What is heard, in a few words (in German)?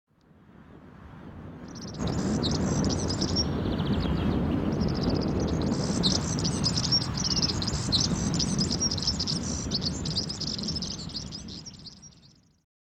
girlitz